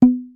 Bottle Pop Up Ui

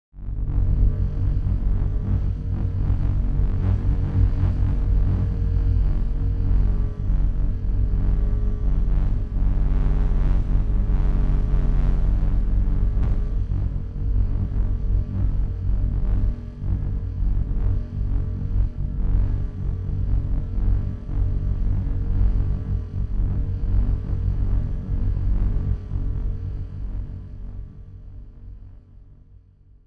Disturbance

